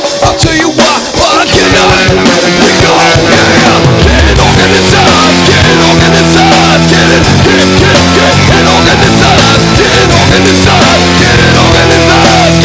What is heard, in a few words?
organ